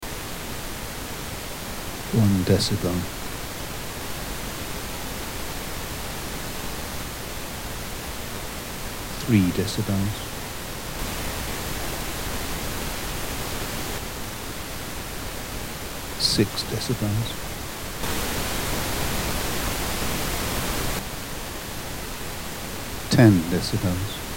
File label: pink noise steps